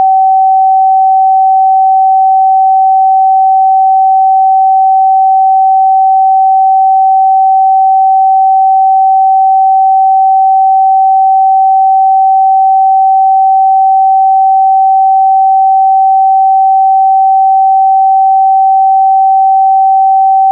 764 SINE LOG; SINE WAVEFORMS

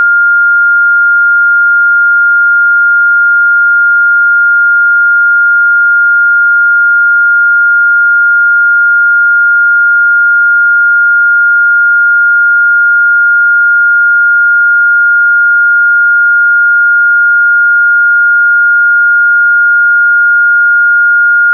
SHEETS 1406 SINE LOG

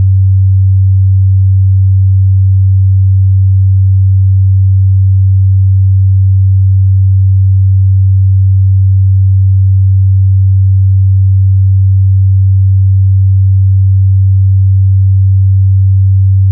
NANOPARTICLES 95 SINE LOG

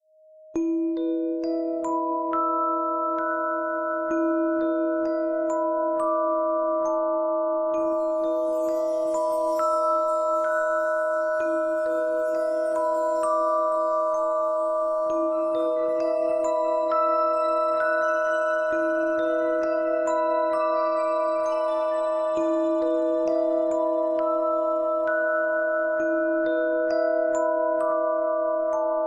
625hz; kidney function

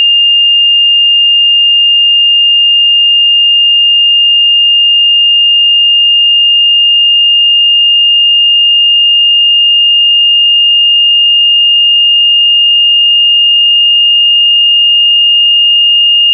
SHEETS 2813 SINE LOG

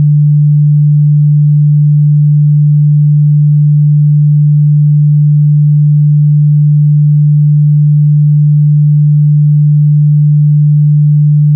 147 SINE

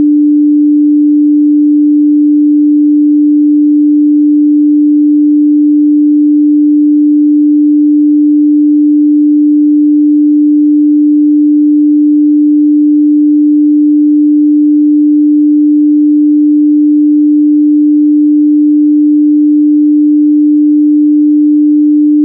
SHEETS 295 SINE LOG

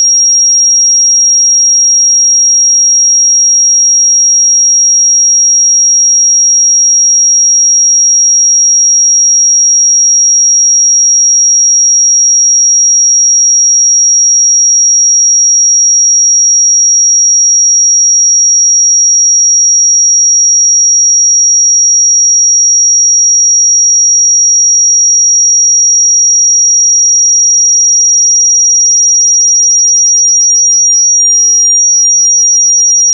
5627 SINE LOG; SINE WAVEFORMS

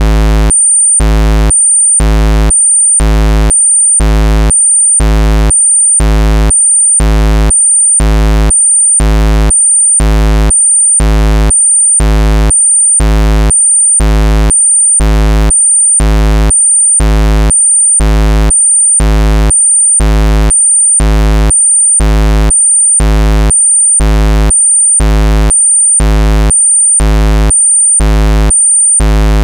PULSE WAVES, HI TO LOW; PARTICLES

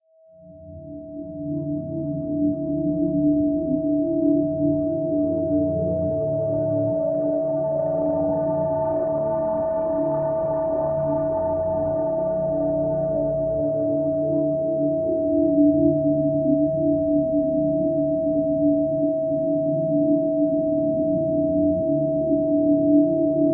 645hz; increased pituitary high production

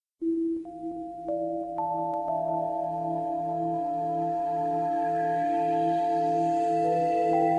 696hz; heart function

